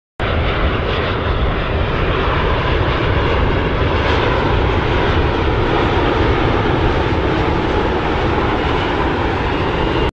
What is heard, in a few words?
Boeing-787; بوئینگ ۷۸۷